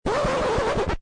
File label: scratch